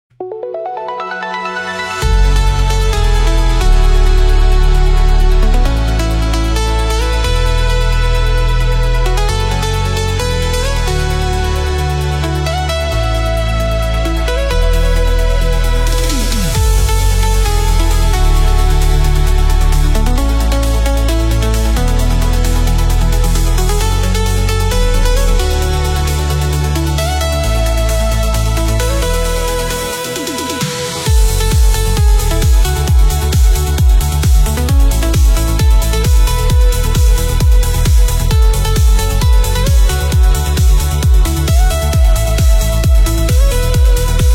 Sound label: Balearic Dawn Rush Melodic Trance Ocean